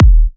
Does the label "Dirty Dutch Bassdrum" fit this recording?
Yes